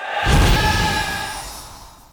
notifications; RED; Hydrogen-One

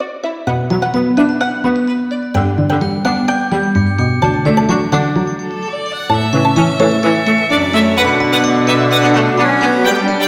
Go Beyond Strings, ringtones; Honor Pad X7

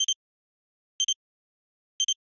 selftimer; FCNT Rakuraku F53 E

